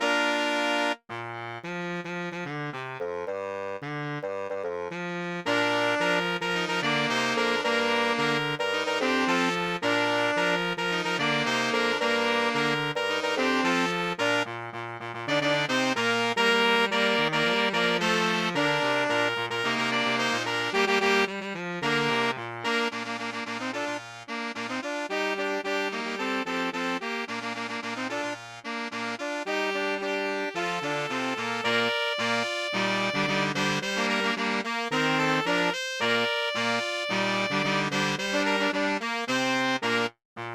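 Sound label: Saxophone Quintet; Pony Music Archive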